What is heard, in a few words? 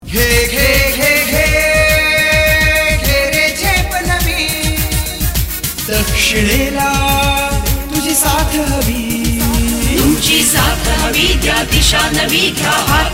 ringtone